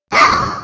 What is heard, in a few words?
Bomb Collision; Angry Birds Space